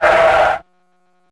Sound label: Skids Tun Long